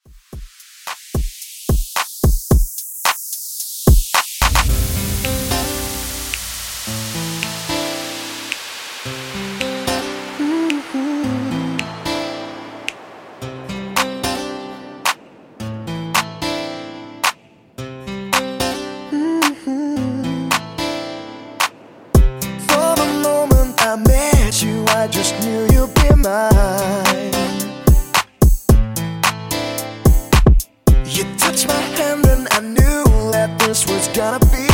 Redrum 110 Bpm Clean

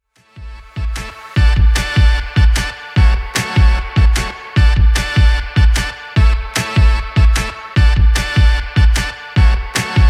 2ks Redrum 150 bpm

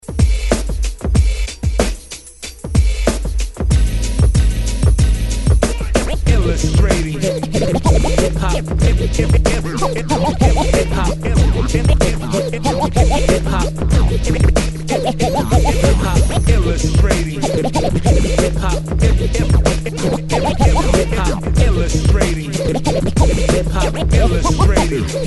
Extended Remix 90s; DJDOLLS

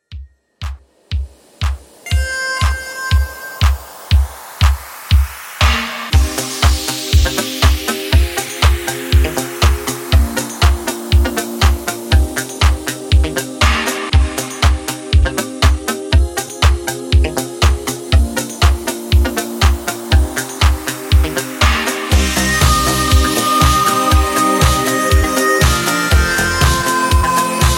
80s Classic Extended 120 Bpm; djyan

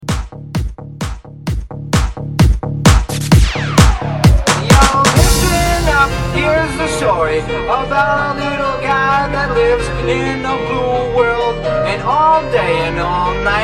130Bpm

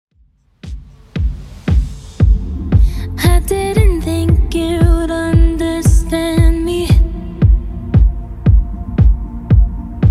Top40 Redrum 115bpm

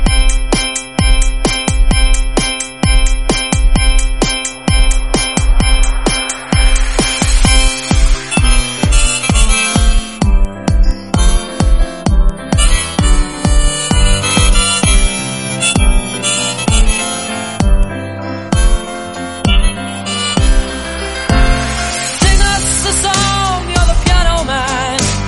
130bpm C Transition Slam End; 1973; remixplanet

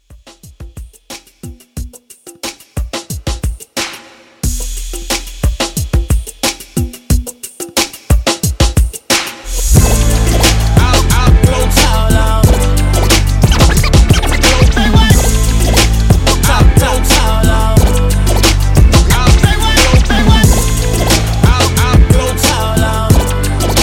Hype Re Drum 90

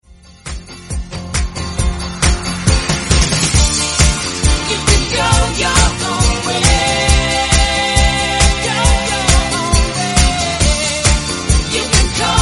REDRUM Snippet 136bpm F

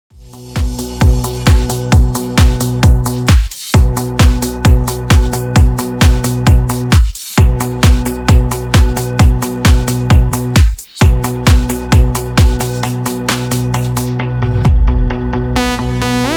132 Bpm

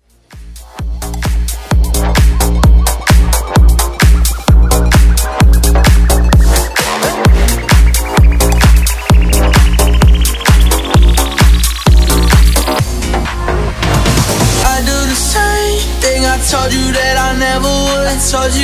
Bass House

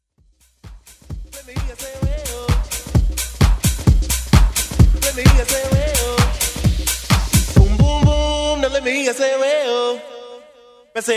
90s Dance Redrum

